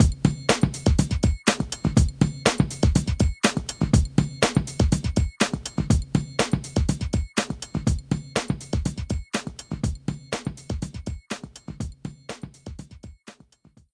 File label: Loading Loop